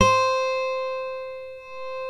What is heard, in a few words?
6 STRING GTR; OMI - Universe of Sounds